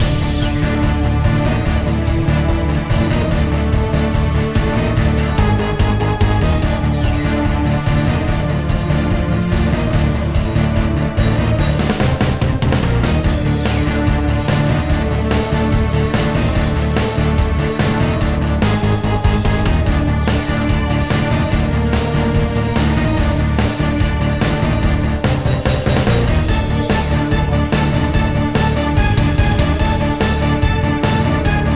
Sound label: LOOP hurry up; Garfield dmx minigame